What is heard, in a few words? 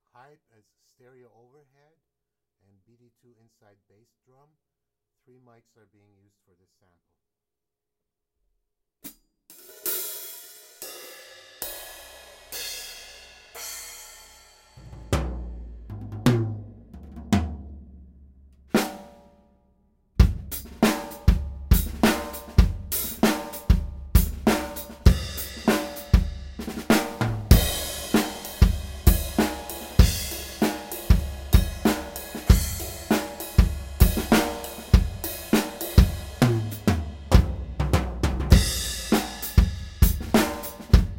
stereo drum overhead